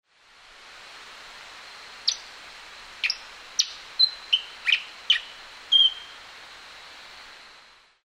streaked fantail

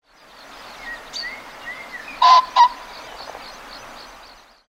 pheasant

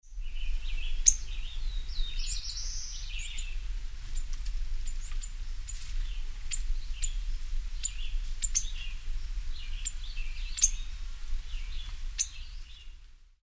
northern waterthrush

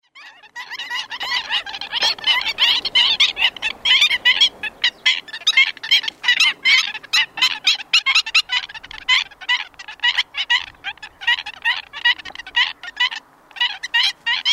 black winged stilt

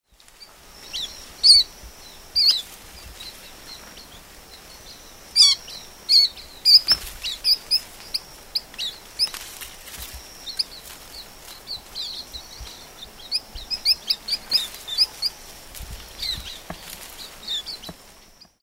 green backed white eye

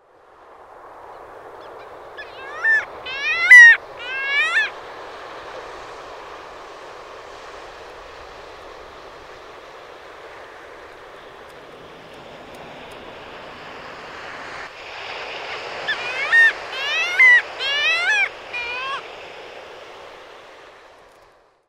arctic skua

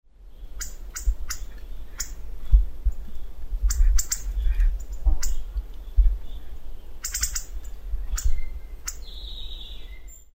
hawfinch

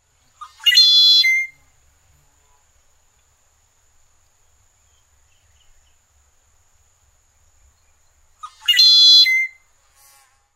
red winged blackbird

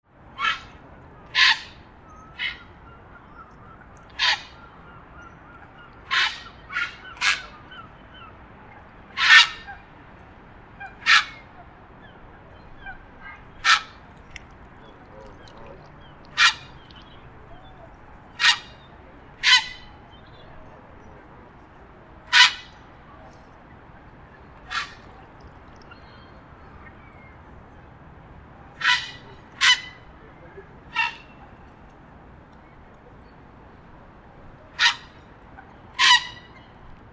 grey heron

